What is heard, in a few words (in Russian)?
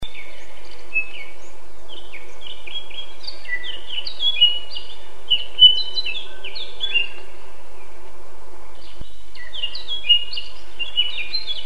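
slavka chernogolovka, blackcap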